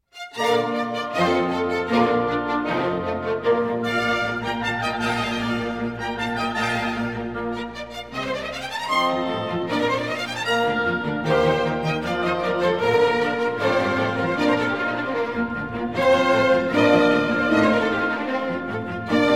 Concerto No. 1 in B-Flat Major for Violin and Orchestra